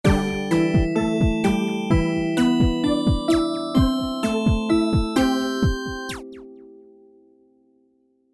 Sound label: Bumper Beat; Undersource OST